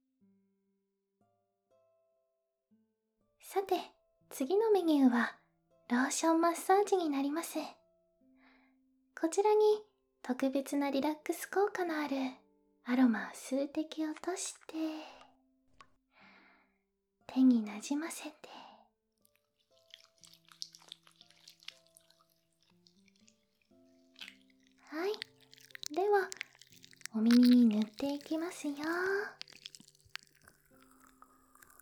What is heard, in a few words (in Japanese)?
ツボを押しながらマッサージ 両耳 特別ローションマッサージ, ASMR; 自律神経を整えたり、疲労効果のあるツボを押しながらマッサージしていきますね